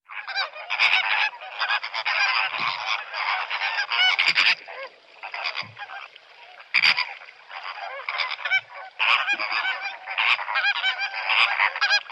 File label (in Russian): flamingo; zvuki ptits